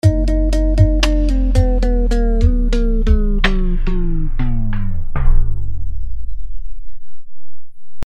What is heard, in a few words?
Tape Stop; TimeShaper 2